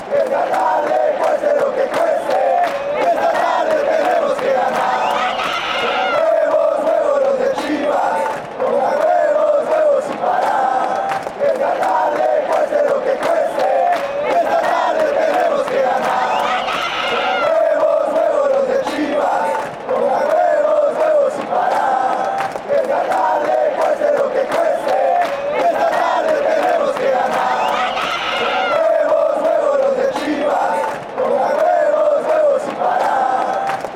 pongas huevos, chants

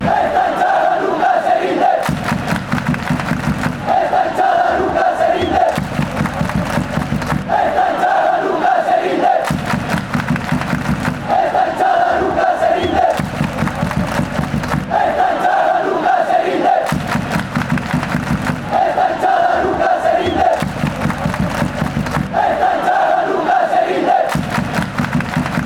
nunca se rinde, chants

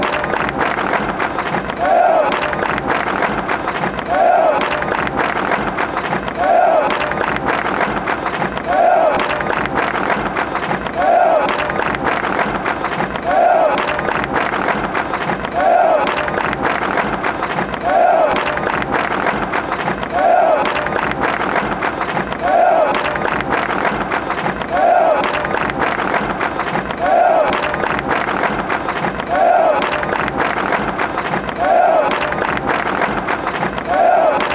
las palmadas; leon

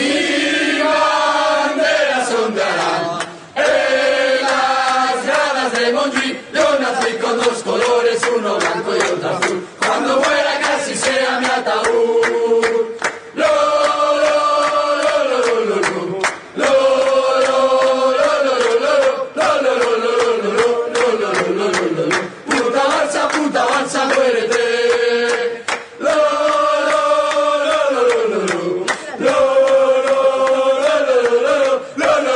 mil banderas ondearan, chants

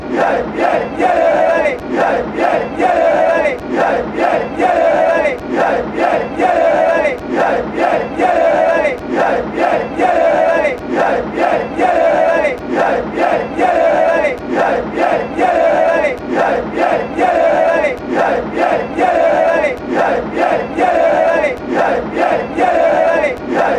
leon canto

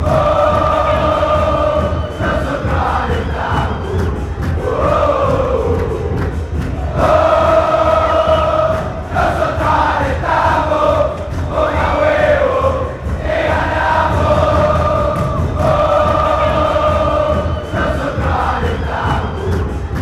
nosotros alentamos, chants

